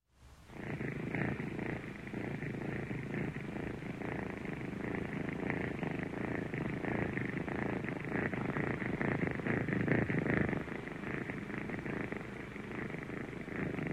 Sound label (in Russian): kotenok murlichet ot udovolstviya